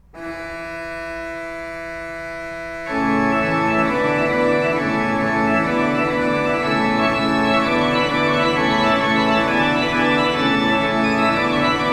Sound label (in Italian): Pifarata; Pifarata Napolitana; basilicata